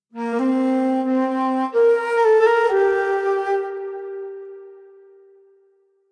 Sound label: flute; canakkale auditory identity